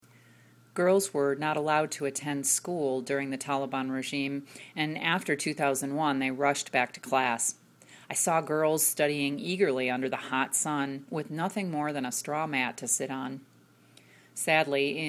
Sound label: Backing